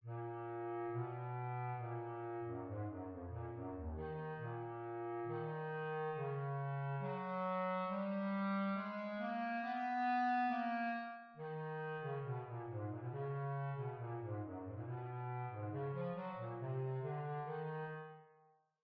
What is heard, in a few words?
Bass Clarinet Excerpt